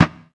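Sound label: exterior backfire, Vehicle; NASCAR Thunder 2004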